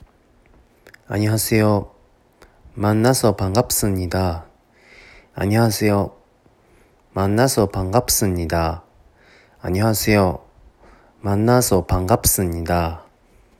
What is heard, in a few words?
aaaoooo